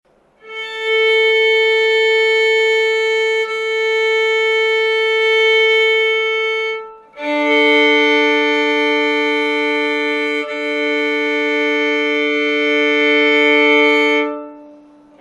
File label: violin tuning